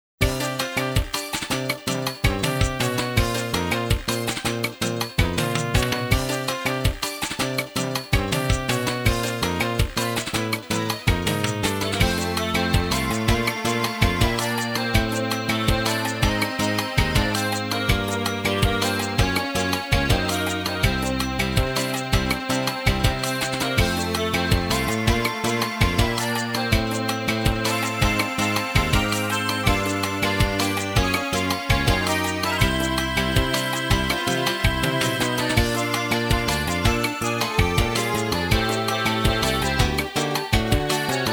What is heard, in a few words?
Melody; Pocket Fighter remix; Capcom Sports Club